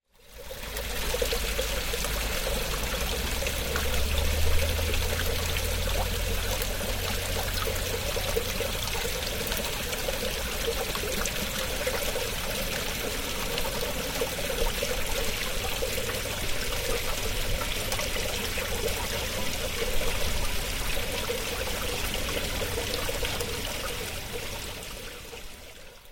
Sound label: stream